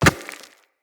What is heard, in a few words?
Walk Rock, Footsteps; Operator